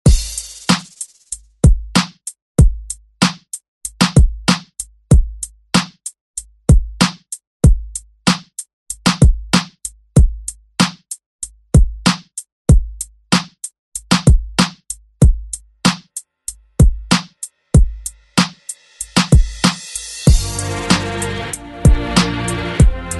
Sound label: Re Drum; DJ Mhark